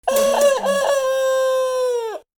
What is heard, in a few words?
rooster crow